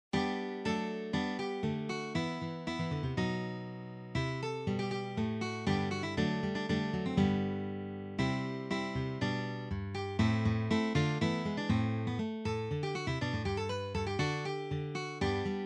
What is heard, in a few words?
Accompaniment